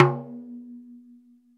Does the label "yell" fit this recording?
No